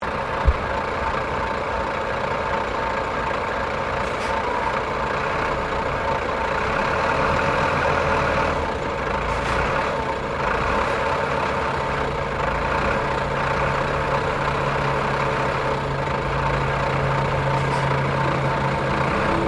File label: Traffic Semi Trailer Drive Off; General traffic sounds; Traffic and Cars; SoundEffects-Foundation4